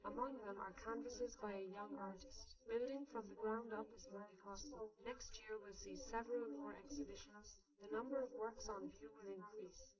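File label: female, live recording 130ms 1m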